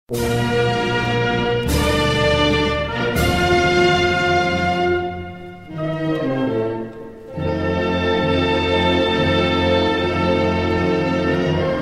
himno argentino

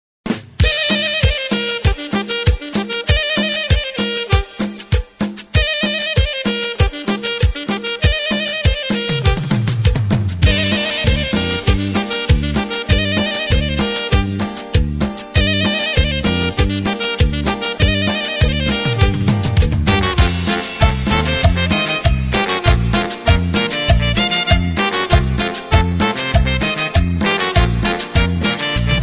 cumbia

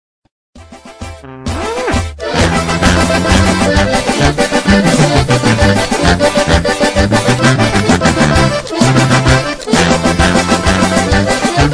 perico norteno con tuba

